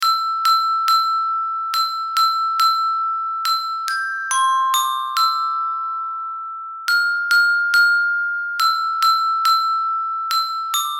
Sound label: Jingle bells